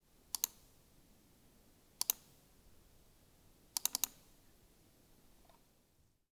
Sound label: Click Mouse